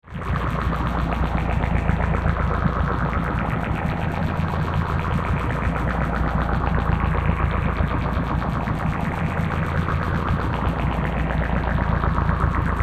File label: Drone, Flange, Gurgle, Scifi; 5.1